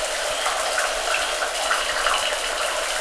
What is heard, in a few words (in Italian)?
Pioggia doccia